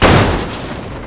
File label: CRASH-X3; CD Action